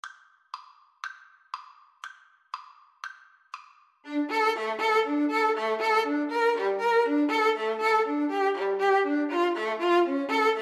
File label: Clarinet Violin Duet